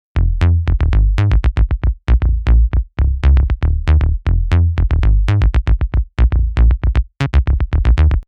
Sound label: sub